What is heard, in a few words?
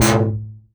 se beam; love2d